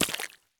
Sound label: run puddle; Escape from Tarkov